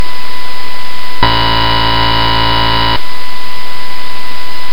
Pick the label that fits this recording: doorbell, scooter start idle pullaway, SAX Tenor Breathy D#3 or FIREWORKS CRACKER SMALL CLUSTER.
doorbell